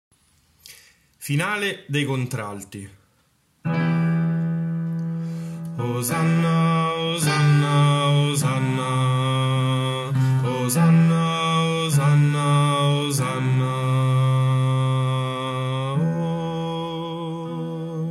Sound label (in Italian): santo osanna nelle altezze contralti